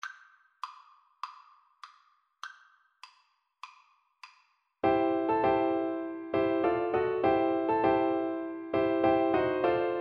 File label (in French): quatre mains, Piano Duet